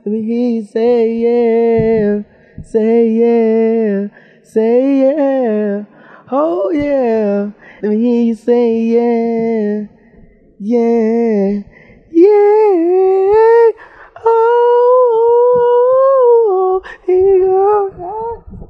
let me hear you say yeah SUNG BADLY

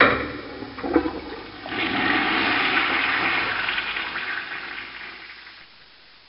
toilet flush

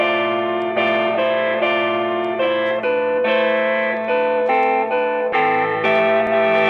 keys, jazz loops

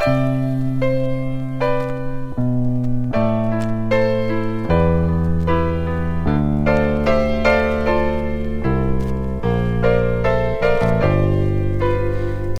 piano, Loops Sad

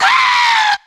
scream lady; ooh ahh

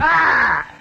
"ah"

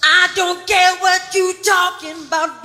i don't care what you talking about SUNG

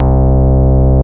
silk, Bass, Notes

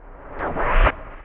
fwish, swish percussion

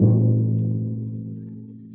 timpany, Toms; Real

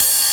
Hats Electro Open

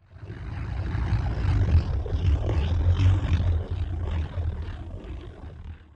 growler; Rumbles

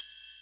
Hats, Odd

